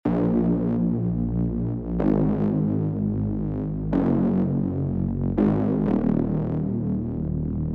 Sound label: Mind Drone